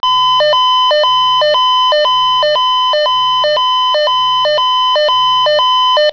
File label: swissphone quattro